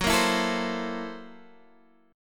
F sharp 7th Sharp 9th Flat 5th F sharp down Guitar Drop D